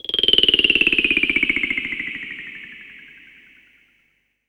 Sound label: noise